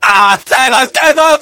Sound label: scout laugh happy